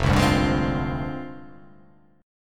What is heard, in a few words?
F Minor 6th Add 9th, Fm6add9 Chord; JGuitar